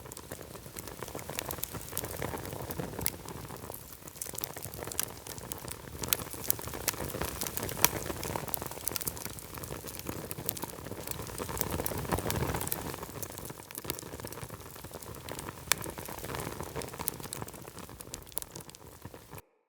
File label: Tent Fire Pot Loop; Soul Plane